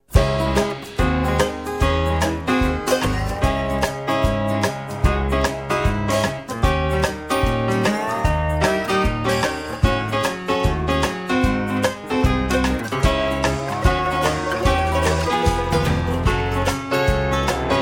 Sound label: theme instrumental